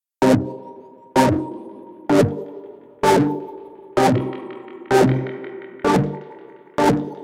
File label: 128 C min Dark Dub Skank; Zulu